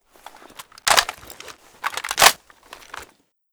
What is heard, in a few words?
aug reload; STALKER; IWP